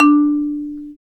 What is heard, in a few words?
Bass Marimba